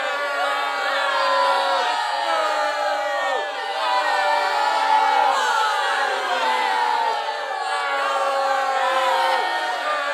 Crowd Boo Hiss Loop

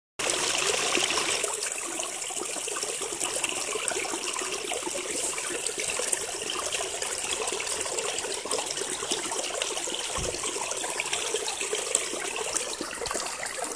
Water

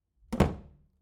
Closet Door Close

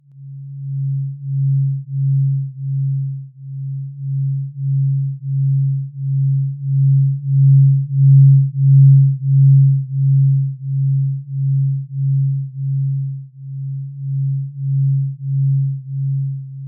Alien Spaceship

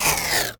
Slow Down Magic

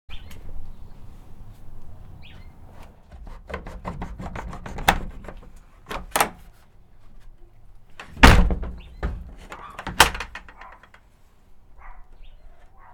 Door And Lock

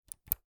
Old Crt Monitor Button Turn Off

